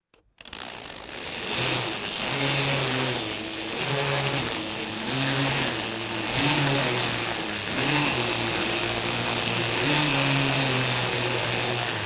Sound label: grass trimmer motor buzzing and stopping; tools